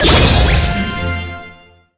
Menu UP; moe girl cafe; android game music

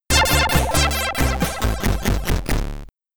Helicopter, SID; SID Stereo; rambo iii commodore 64

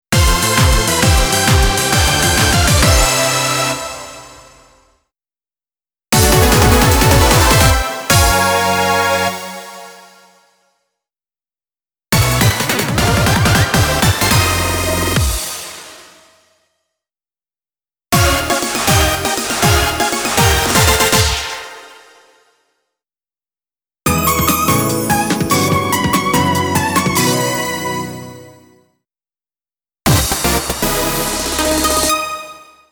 Jingle Collection; GRADIUS THE SLOT

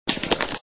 stone break; Tempala

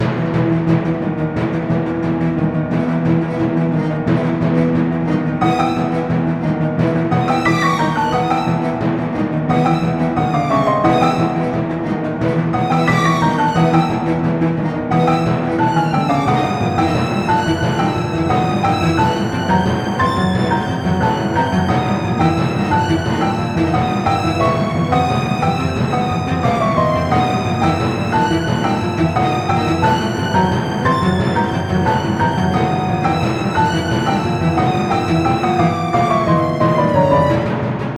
Suspense

